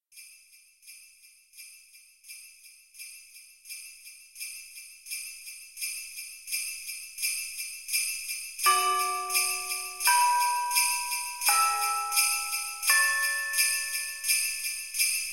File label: dreamy xmas